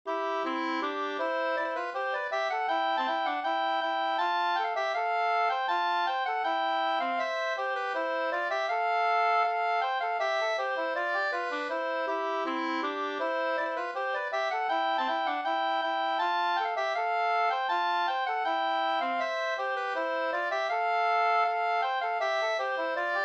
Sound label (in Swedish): skanklat 4-4; Osterhaninge; Robert Nordstrom